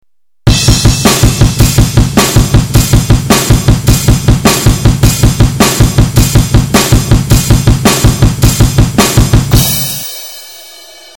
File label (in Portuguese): digital drums pedal duplo exemplo intermediario